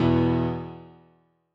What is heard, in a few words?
chord